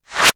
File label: Reverse clap; Drums; ZERO G - Datafile DISC 3